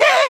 Gasp; EDD; Ed Edd n Eddy - Adromulus Pack; EEnE

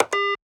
Computer Button Press; Ed Edd n Eddy; Adromulus Pack; EEnE

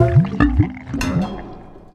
Water - Jiggle Wobble; Ed Edd n Eddy; Adromulus Pack

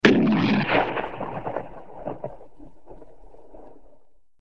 gunshot, weapons

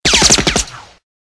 machinegun ricochets, weapons

